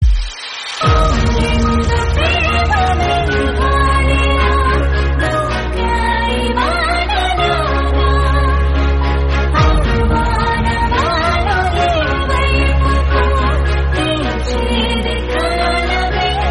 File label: Female Song Ringtone; Baby Movie